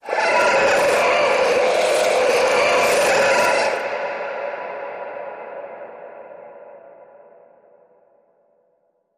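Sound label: creature; Children Of The Dawn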